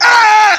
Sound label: hurt sound; tommy vercetti